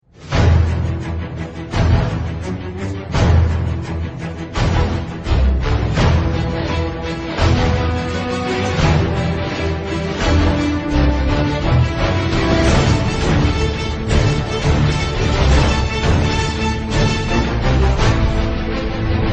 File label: ringtone; expendables 2